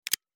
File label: clicking sound